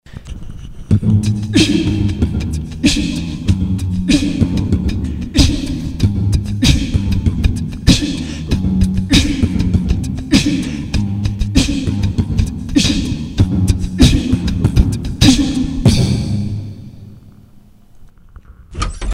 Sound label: groove